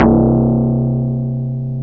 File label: FM Perc; Rap